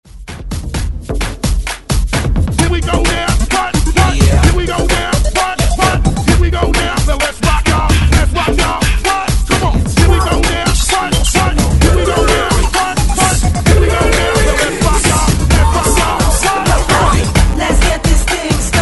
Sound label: Hype ReDrum 130 Bpm; DJDOLLS